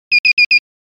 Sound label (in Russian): Выход 4 коротких